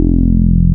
Plus Octave Saw, wavetables; Animoog

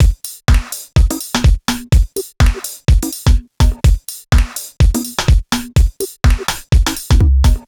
Full Loop 125, Loungin House; Equipped Music

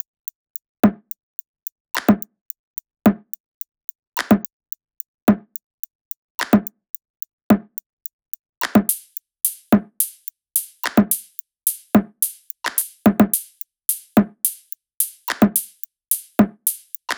loop top 108, Seismic Loops

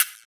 EQ Perc, Percussion Mix

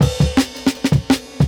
Jungle Breaks; Original Jungle Breaks

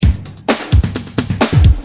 Drums, Perc, Industrial

